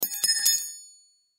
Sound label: Knife Drop Metal; Knife Sound Effects; Knives (Sound Effects:Combat); Blastwave; Warfare